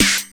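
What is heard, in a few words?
snare drum, 170 BPM, drum & bass; Ueberschall; Drum & Bass Flash Trackz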